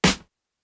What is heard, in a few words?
Clap